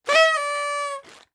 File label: party horn; twitch